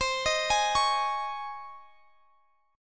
Db Major 7th Suspended 2nd C

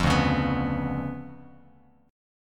D Minor Major 13th, down, Bass Standard; DmM13 strummed; JGuitar

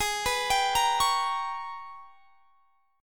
Ab Minor Major 11th down; arpeggiated; AbmM11

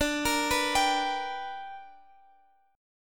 B-Minor Major 7th Sharp 5th D

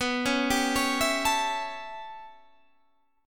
A 7th B, chord; A7/B; arpeggiated

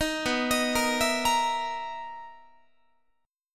B Major 11th B down Guitar; arpeggiated

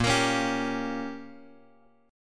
A sharp Minor 6th A sharp, Guitar chord; A#m6 Chord {X 1 X 3 2 3} strummed

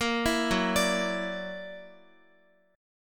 Gb Augmented 7th, down, Guitar; arpeggiated; 4E,4A,5D,5G,5C,6F

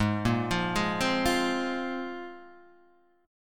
Bb 7th Suspended 2nd Ab

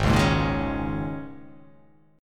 Ab Suspended 2nd Suspended 4th, Absus2sus4 chord; strummed; JGuitar

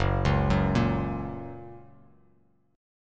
F sharp Major, F sharp, chord; arpeggiated; 2,4,4,3; JGuitar

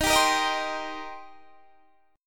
D sharp 6th; D#6 strummed

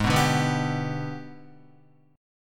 Ab Minor Major 7th Flat 5th, down, Guitar; AbmM7b5; strummed

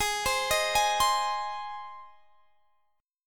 G sharp Major G sharp, chord; arpeggiated; x,23,22,20,21,20

